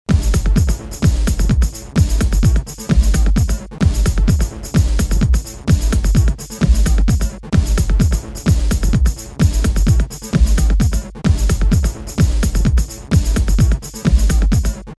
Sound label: Dance Disco Dance BPM120